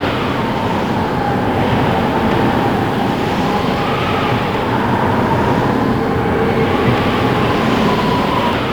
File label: WIND; USB Soundscan vol.02; Underground Hip Hop; AKAI; AMB.FX